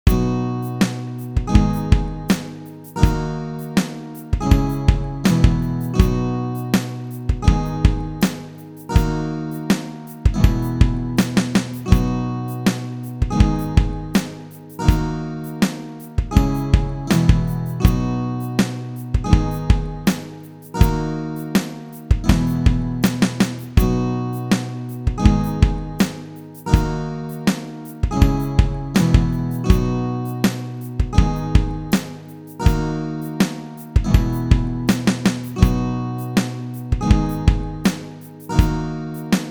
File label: Acoustic set